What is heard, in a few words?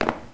tile land; Golden Crayon Sounds